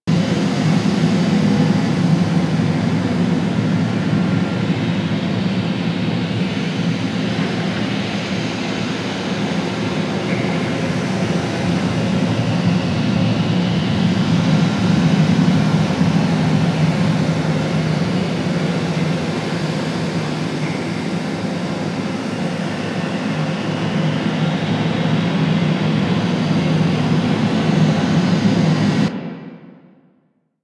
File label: Ocean Interior Amb